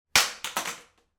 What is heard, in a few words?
crutch drop on floor; foley